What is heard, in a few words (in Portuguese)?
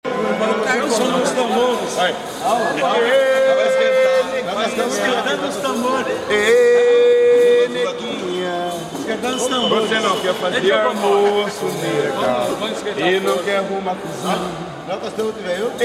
batuque de umbigada, piracicaba; neguinha